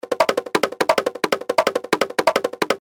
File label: Bongo Conga 174